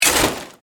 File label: Ice Chest Scooper, Stab, In, Ice, Restaurant; Miscellaneous Bar